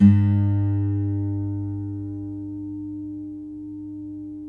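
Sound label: GUILD 12-string, Guitars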